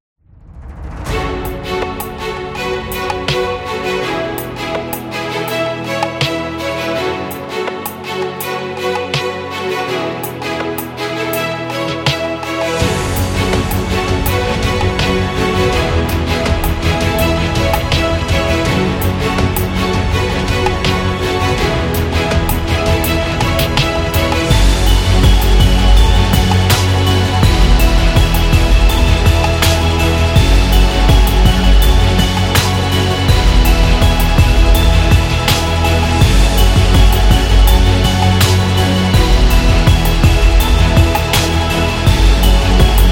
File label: Hip Hop Trailer